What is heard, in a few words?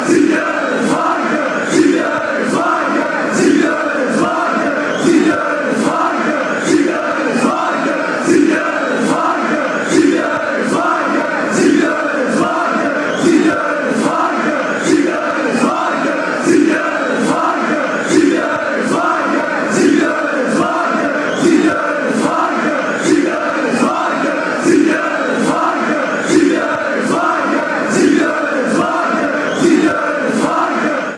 sit and shut up